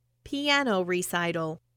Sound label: piano recital